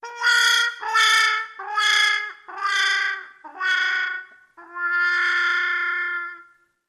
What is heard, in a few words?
Trumpet Slow Mute Wah Wah With Growl